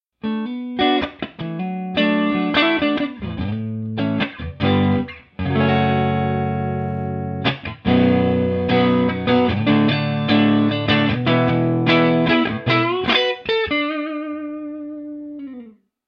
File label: clean; neo12-100